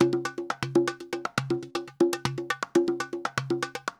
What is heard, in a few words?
120 perc, Nu Jazz House; PowerFX